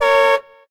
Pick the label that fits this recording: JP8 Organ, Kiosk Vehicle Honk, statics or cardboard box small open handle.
Kiosk Vehicle Honk